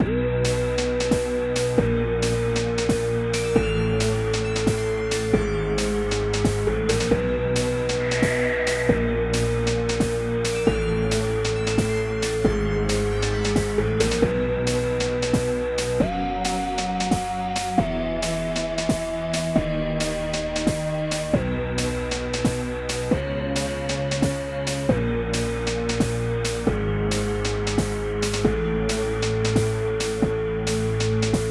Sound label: dungeon loop; Secrets of Arcadia